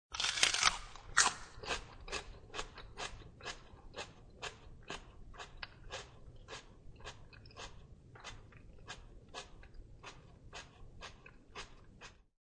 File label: Biting and chewing a fresh piece of celery.; Eating Celery; Eating Sound Effects; Human